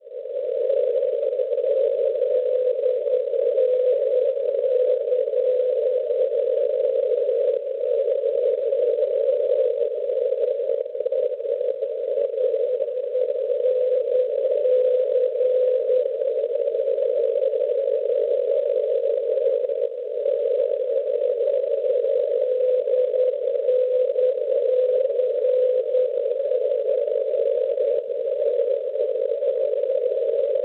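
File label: non-directional beacon 425 kHz; DNC; Mostar; Bosnia Erzegovina; Schwarzsee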